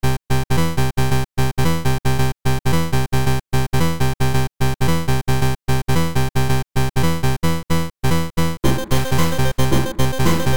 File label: 8 Beats Jam